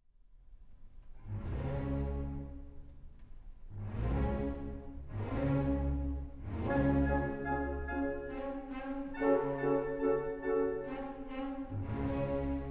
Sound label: Orchestra Choir; The London Chorus; Nabucco